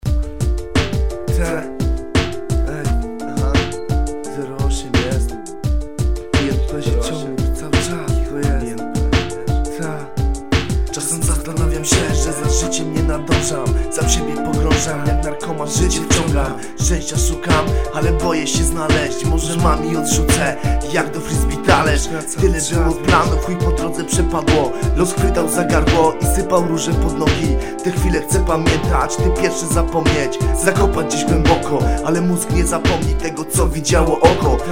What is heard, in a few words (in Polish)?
sand solo cisza